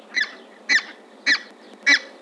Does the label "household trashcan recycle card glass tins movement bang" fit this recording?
No